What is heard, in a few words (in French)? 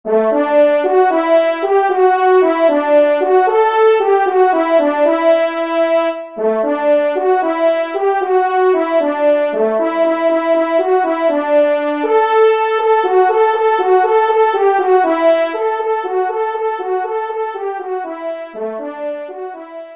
TRP